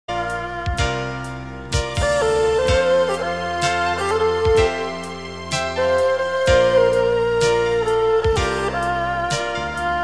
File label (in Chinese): 广告片音乐